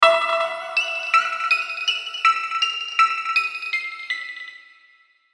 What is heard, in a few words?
Musical Exotic Melody; Miscellaneous Musical; Blastwave